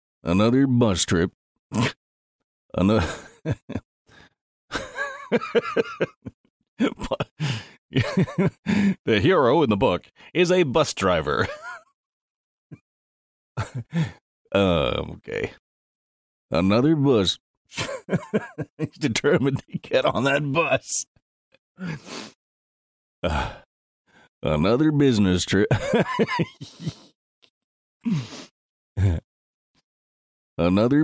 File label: audiobook out take punch drunk laughing fit